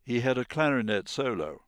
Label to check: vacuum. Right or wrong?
Wrong